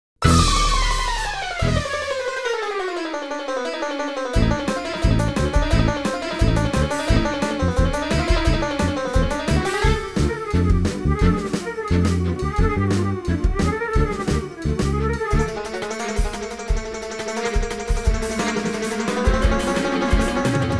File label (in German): hummelflug Rock Band